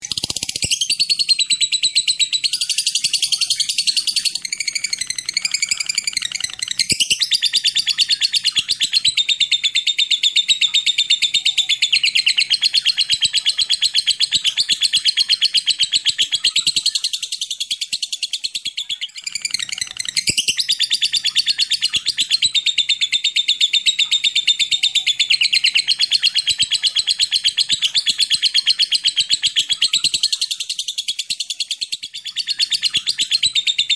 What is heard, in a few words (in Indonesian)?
suara burung lovebird ngekek panjang